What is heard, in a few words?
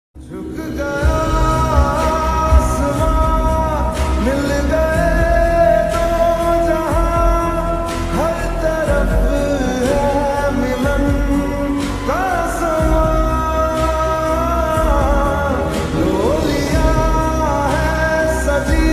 hindi sad ringtone love ringtone romantic ringtone